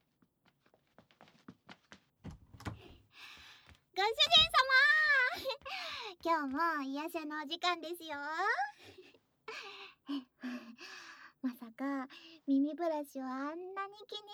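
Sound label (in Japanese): 耳舐め（右耳）; 耳舐めご奉仕♪奥の奥まで舐めちゃいます♪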